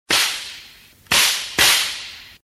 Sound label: whip